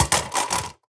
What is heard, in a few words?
phys mag drop large drum concrete, magazine drops